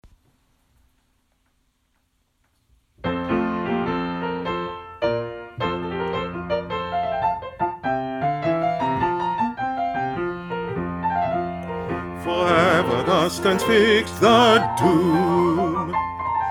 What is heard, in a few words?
For ever thus SATB bass part